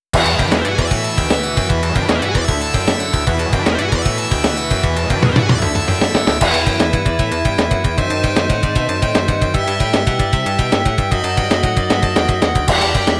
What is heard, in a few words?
OPNA BOSS; FIRE HAWK; BOSS 2; Game Arts Best Collection; PC-88; Genesis